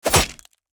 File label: skeleton strike; Shelter